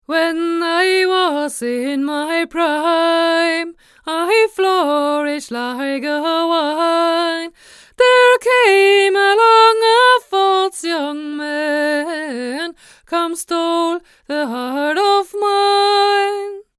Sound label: Miktek CV3 far